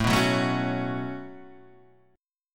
C sharp 7th Suspended 4th Sharp 5th, A, guitar chord